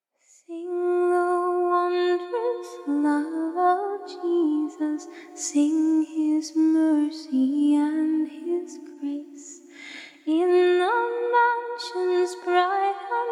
acapella hymns

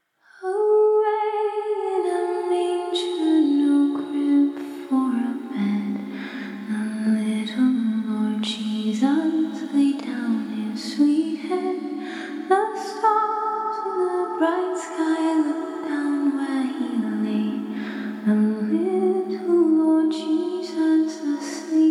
acapella hymns

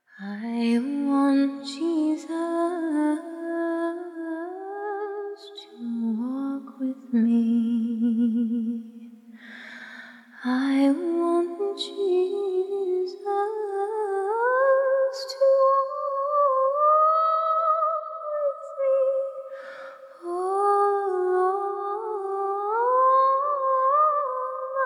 acapella hymns

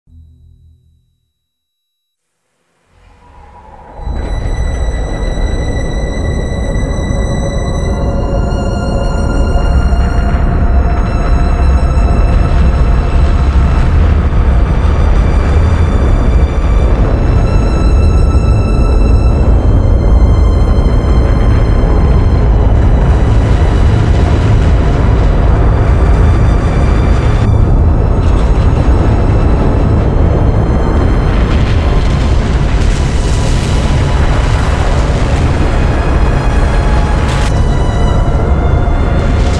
remix instrumental

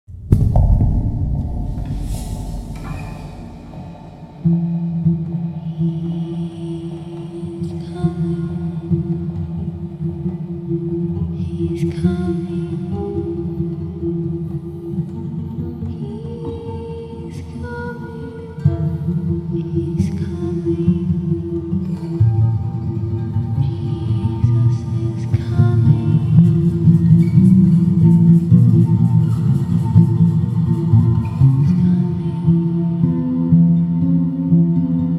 guitar with vocals

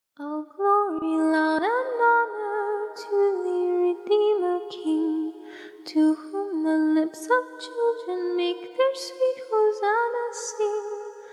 acapella hymns

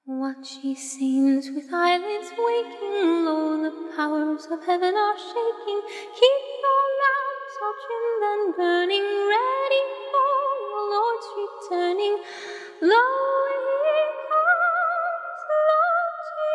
acapella hymns

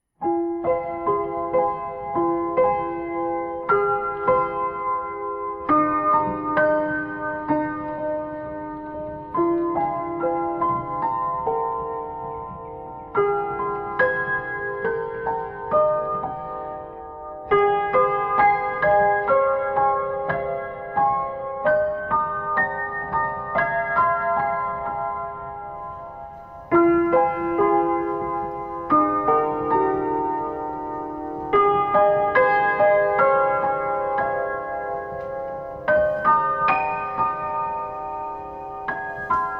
music box piano

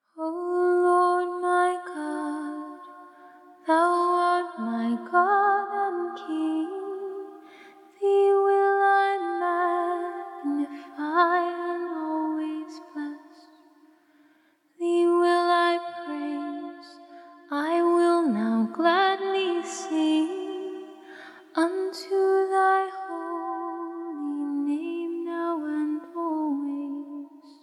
hymns of psalm acapella hymns

